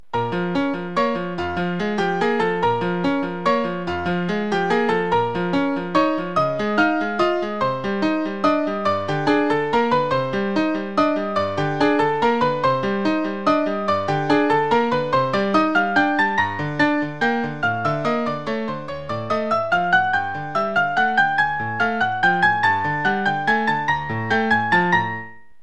valse

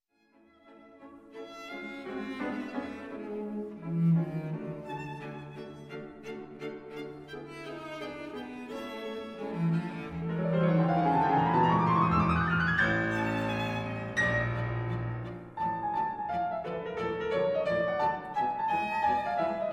Trio

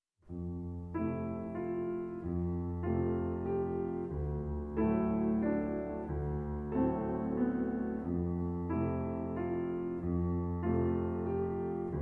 Waltz

